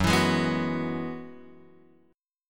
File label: F sharp 7th Suspended 2nd Suspended 4th F sharp down Guitar Open F; F#7sus2sus4 strummed